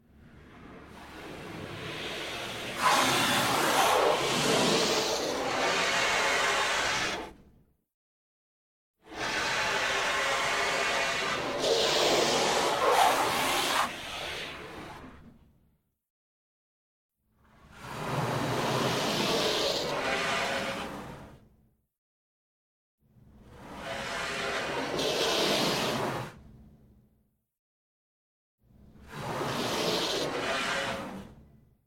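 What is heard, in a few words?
Water Spray Interior Car Around Soft Short; Car Wash; Spraying; Sound Effects:Objects; Big Room Sound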